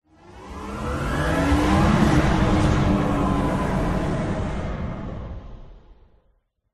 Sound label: cruiser flyby animation particle swish swoosh accent logo sci fi futuristic; alliance; alien ufo; epic stock media